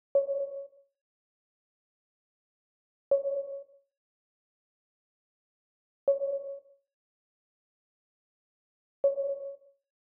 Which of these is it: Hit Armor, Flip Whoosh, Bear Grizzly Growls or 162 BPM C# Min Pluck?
162 BPM C# Min Pluck